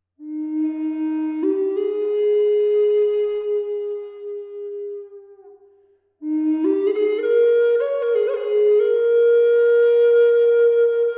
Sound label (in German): Essigbaum E 432 Hz; Schnecke; Uhufeder